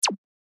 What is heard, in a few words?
Zap